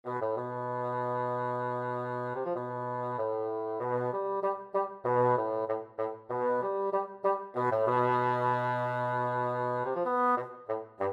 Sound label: Solo Bassoon